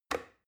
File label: plastic button start push regular